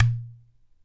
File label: IPU GOURD, HAWAII PERC